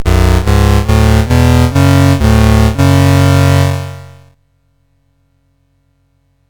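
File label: Mountain King, MOS 6581 SID chip music, games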